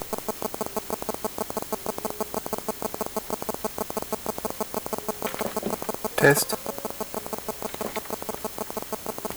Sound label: interference call